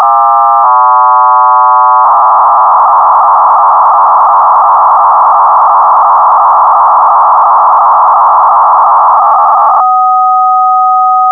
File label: psk500R